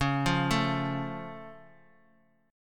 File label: Db Augmented, down; arpeggiated; JGuitar